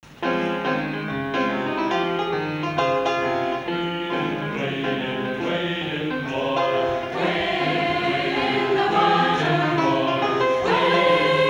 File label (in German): Schulkonzert Gospel Chor; Wade in the water